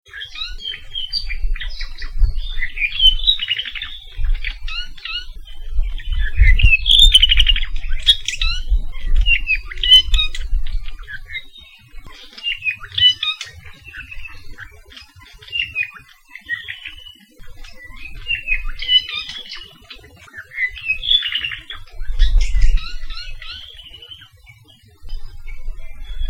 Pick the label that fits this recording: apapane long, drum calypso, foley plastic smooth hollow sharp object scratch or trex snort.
apapane long